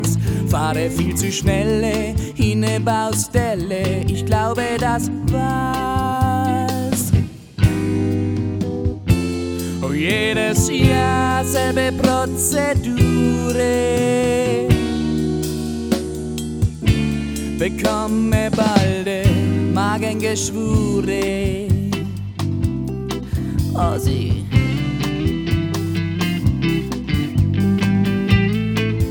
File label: Italo Song